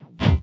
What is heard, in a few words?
BD Gate FX, Bassdrums; Vengeance Samples